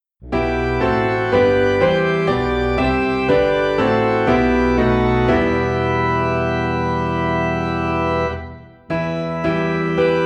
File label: Piano and Organ in E Minor; christmas; God Rest Ye Merry Gentlemen